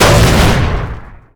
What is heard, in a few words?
Explo Small Barrel; Destruction